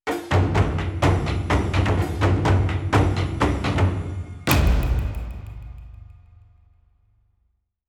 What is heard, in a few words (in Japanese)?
大太鼓の和風ネタで使えるジングル 掛け声なし; japanese style jingle drums